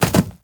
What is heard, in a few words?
Grass Jump; moul